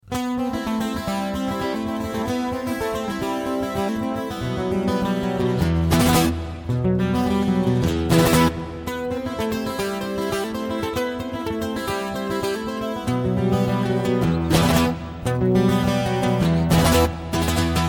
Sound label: guitar duo flamenco